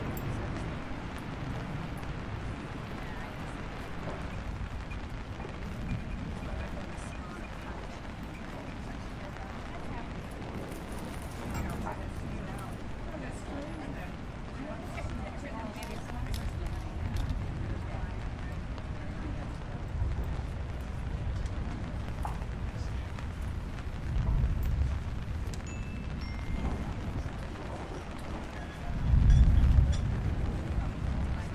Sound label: Espresso Machine Hum